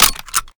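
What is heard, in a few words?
sniper reload fast load; gmod; viper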